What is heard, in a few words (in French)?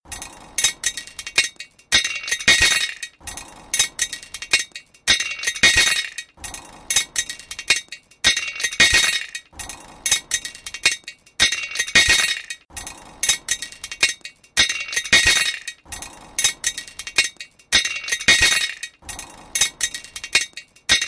Cailloux